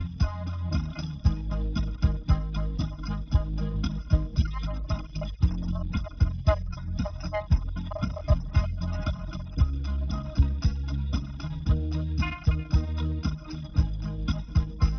mus

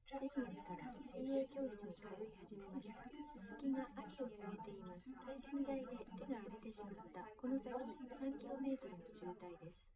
female live rec 1m sim; SASSEC07

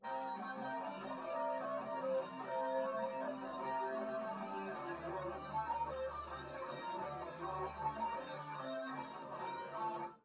nodrums; SiSEC10; underdetermined

